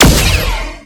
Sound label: Ogre Shoot